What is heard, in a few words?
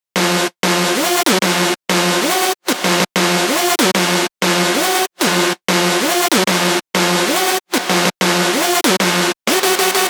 Synth Glide F-m